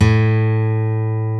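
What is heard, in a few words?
acoustic guitar, country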